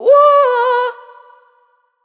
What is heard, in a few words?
Woo wet, adlib; Slate Digital Omnivox Vocal Sample Pack; Adlibs